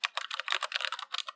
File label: keyboard typing